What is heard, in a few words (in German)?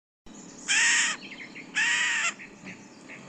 Aaskrähe; Mag. Peter Holub